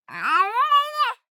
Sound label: BABIES TALK Boy 12 months; Baby Boom